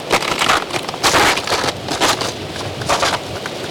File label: Ice Steps, On The Glacier